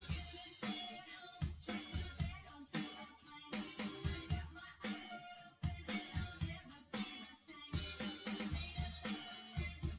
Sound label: wdrums synthconv 250ms 1m src; SiSEC08; SiSEC underdetermined